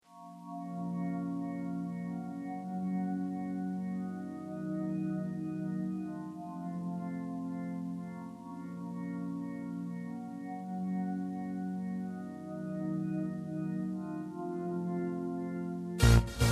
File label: quick chill out